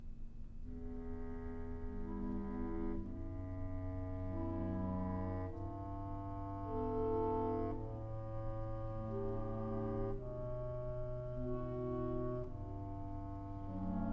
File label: Concerto For Flute & Wind Ensemble - Mvt. 2. Nocturne